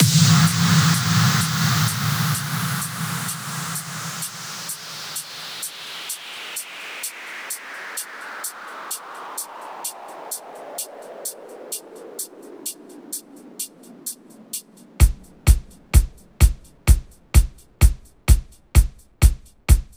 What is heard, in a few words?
128BPM, House Electro Fills; 32Bars; Spaceman; Vengeance Samples